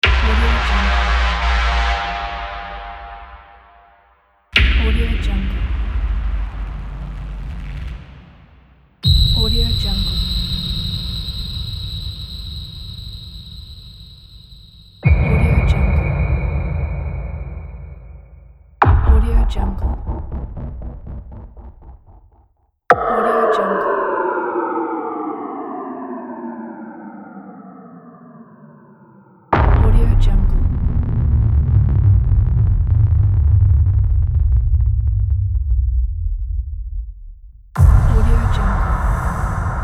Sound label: modern minimalist impacts bundle